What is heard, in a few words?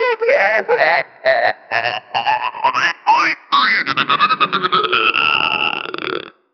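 baby crying sound in, infant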